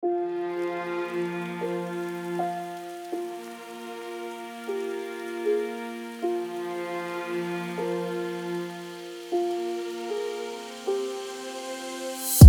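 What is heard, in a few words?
Angry Sad Trap 155bpm F minor, Beats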